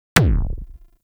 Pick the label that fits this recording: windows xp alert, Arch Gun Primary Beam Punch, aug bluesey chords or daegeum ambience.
Arch Gun Primary Beam Punch